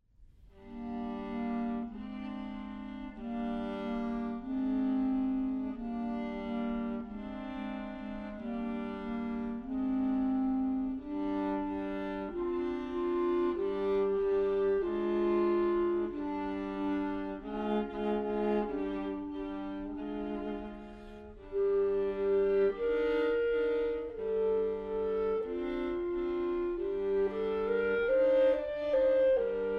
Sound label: Prelude, Allegro and Pastorale for Clarinet & Viola, Prelude Andante sempice; Bruch